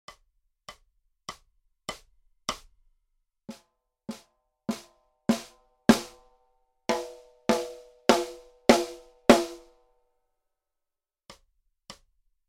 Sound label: Snare Drum - Hickory Sticks